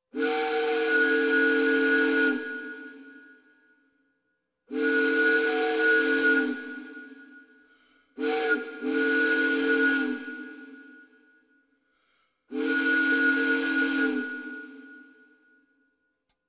gudok parohoda

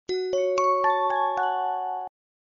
korotkiy grustny zvuk

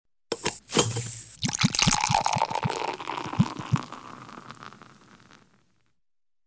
iz butylki s otkryvaniem; Звук наливания пива; Наливания из бутылки

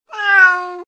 koshka govorit mjau

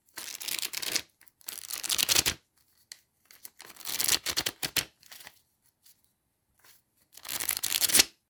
varianta proshhelkivanija palcem torcevoj storony pachki dollarov; Звук купюр, долларов

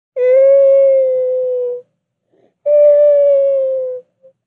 dva zhalobnyh vozglasa

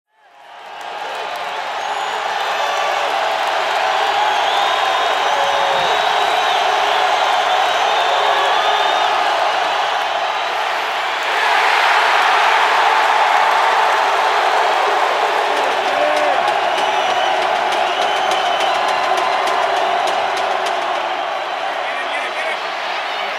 volny reakcii stadiona; Звуки футбола; Звуки игры в футбол

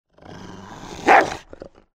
ruchit i brosaetsa s laem

